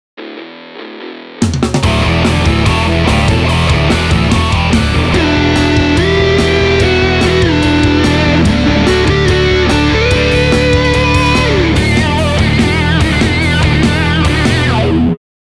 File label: power hook